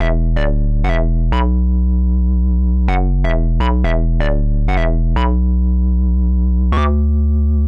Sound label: Hard Synth Bass E, 125; PowerFX - Nu Jazz House